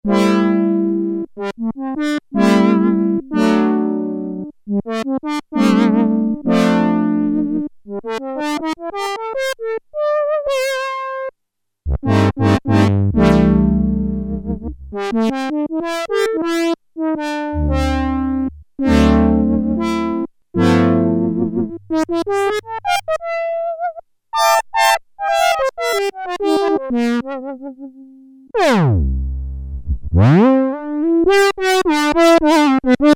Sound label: Filter Mod; Sequential Circuits Prophet-5 (Ver.3); Factory Patch